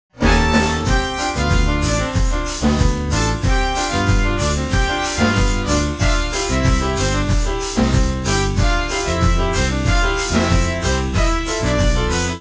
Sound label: Jazz